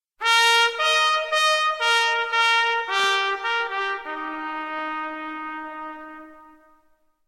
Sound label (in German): Badnerfanfare